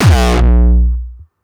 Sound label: kick; shock. Ultimate Kicks vol. 2